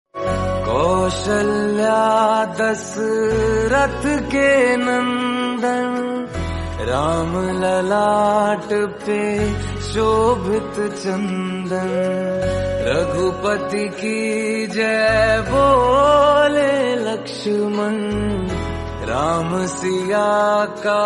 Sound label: Song Hindi